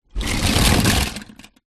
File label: leisure toy cars in cardboard box movement